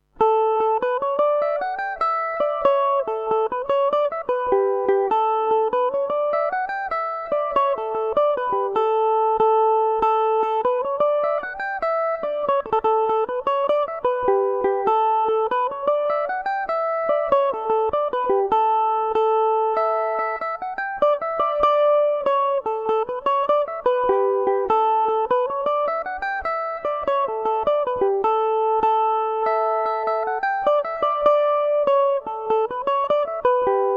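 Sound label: A mixolydian revelation, Irish mandolin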